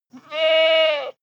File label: goat baa desperate male; natur tiere; ziegen